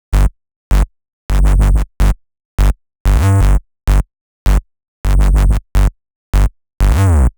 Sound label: 128BPM Bass Root G; Vengeance Samples; Hopper Kit